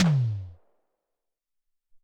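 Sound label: Low Hex Tom, Alesis SR-18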